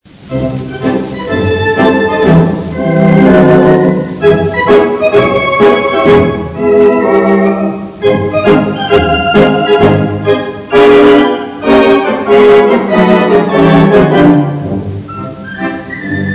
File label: Whistling in the dark